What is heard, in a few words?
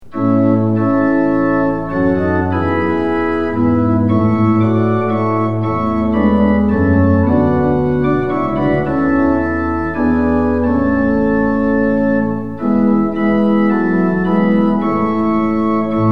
tune; hymns; lillenas